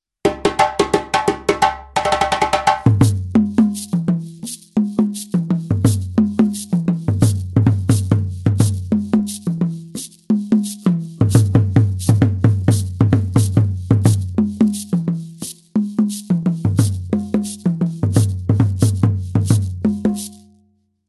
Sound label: yankadi dununba, rhythms; adv ballet